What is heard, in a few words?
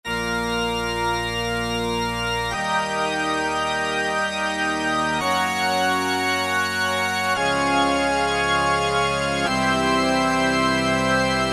Pipe Organ, Korg MSC-9S Organ